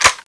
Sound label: clip out; tfa cso